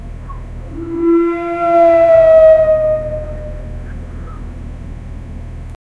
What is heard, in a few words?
sound of titanic class st, ships